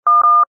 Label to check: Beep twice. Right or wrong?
Right